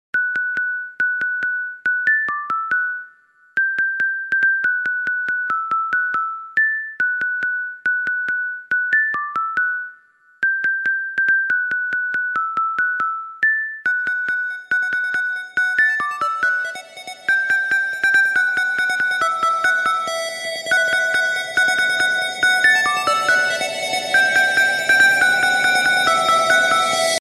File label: jingle bells sms